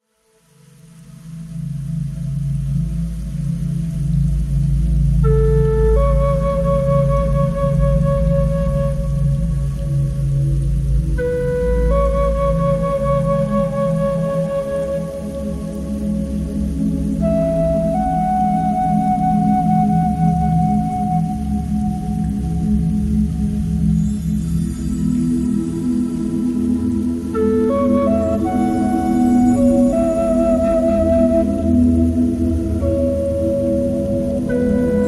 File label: Nanxiao Relaxing Rain